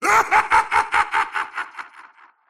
wheel laugh; dota; razor

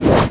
blink out; weapons; dota; queenofpain

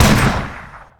attack; dota; snapfire

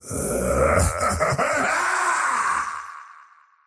short laugh; dota; faceless void

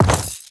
quiver equip, inventory

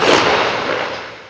skate, footsteps; creeps; neutral; greevil

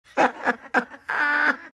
vipe laugh; dota; viper

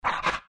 pant big, greevil; courier

